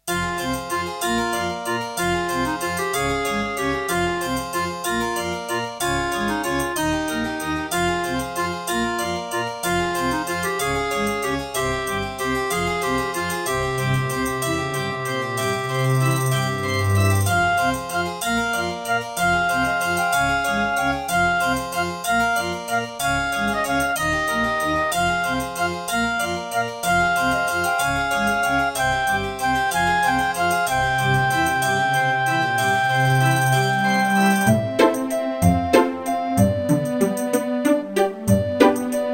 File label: Anteg EasyMIDI EM-100; Death Gate